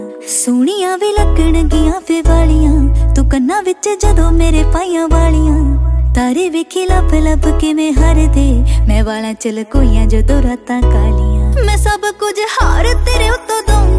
Female Version Ringtone

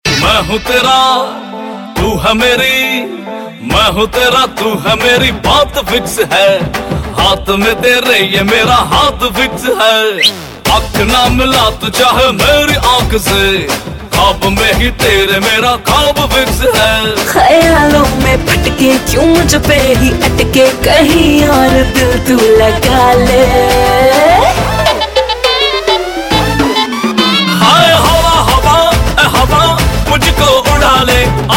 Bollywood Ringtone

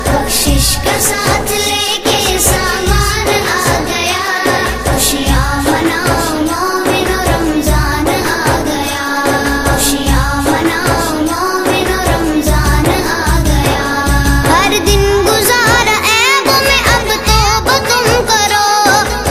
Ramzan Ringtone; ringtones

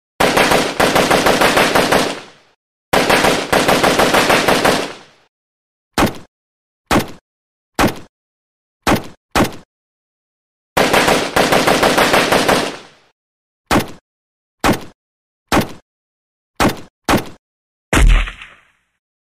AK47 Gun Sound Ringtone; ringtones

